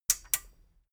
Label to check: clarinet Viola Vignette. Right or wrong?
Wrong